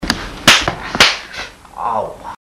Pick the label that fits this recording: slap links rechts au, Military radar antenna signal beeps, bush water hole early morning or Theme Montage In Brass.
slap links rechts au